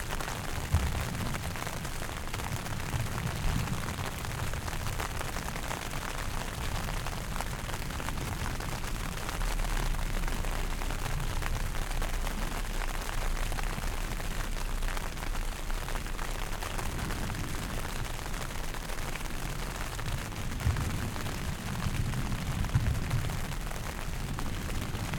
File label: WEATHER Rain, Large, Camping Tent near Sea, Isle-au-Coudre, Canada, LOOP; Articulated Sounds; Moody Rain Loops